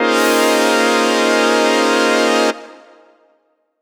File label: lead blurt F; leads